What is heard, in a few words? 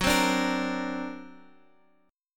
D Augmented 7th, chord